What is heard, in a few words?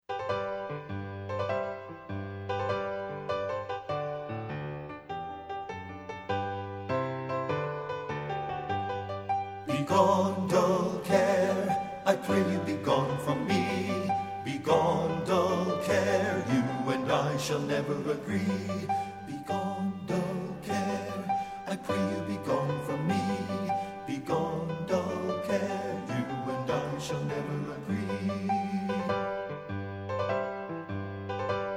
BASS; men